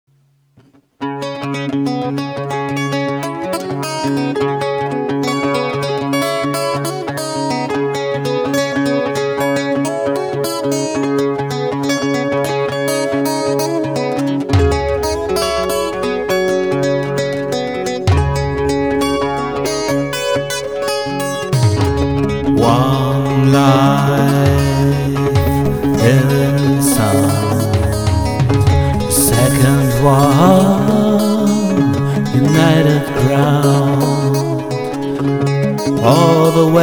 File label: String Movements